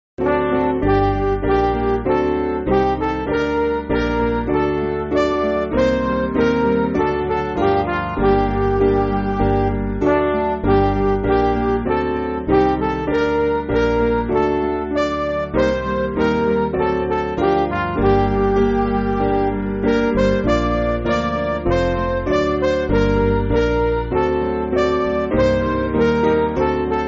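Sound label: Midi, Backing Files for Churches; A Little While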